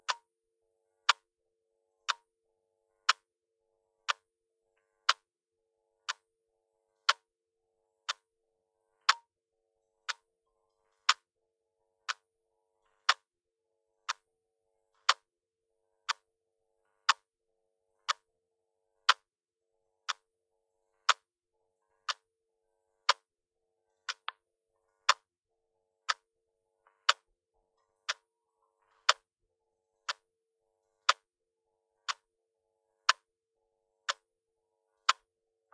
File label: watch; devices accessories utilities; NS Studios - ultra sound collection